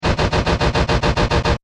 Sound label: Repeating Power Sound, Science Fiction Sound Effects; Repeating Power Sound 02